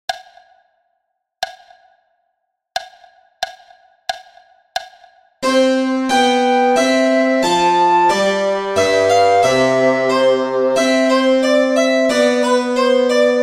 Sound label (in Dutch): vivace alt; Blokfluitles in Utrecht